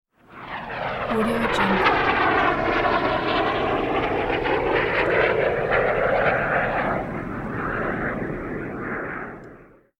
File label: mini jet flying overhead fast; hollywoodedge